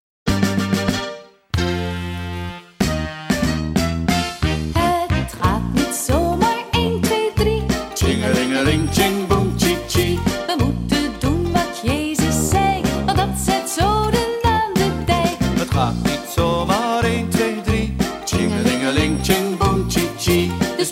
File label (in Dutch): Tsjingelingeling